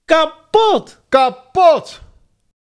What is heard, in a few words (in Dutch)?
ringtone kapot